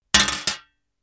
metal plate thin drop

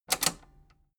metal button robust spring click disable fast

